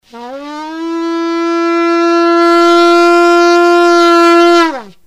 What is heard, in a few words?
tekiah, shofar sounds; yeshua; feasts